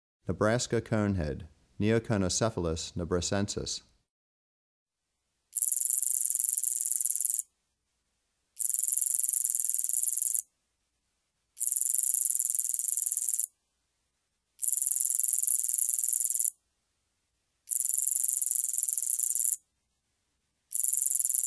Nebraska Conehead Katydid, Neoconocephalus nebrascensis; Crickets and Katydids